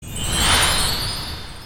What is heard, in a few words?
dice; Tischspruch Würfelspiel